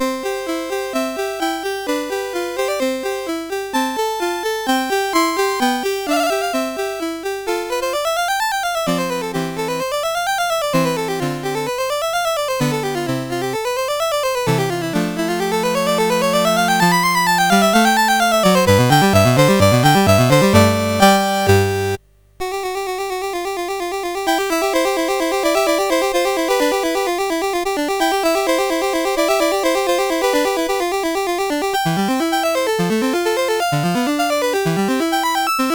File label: Sonata, CSG8580R5; Sonata No3; Dave Burkus